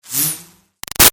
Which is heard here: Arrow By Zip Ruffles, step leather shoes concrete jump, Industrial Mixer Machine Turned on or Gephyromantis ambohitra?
Arrow By Zip Ruffles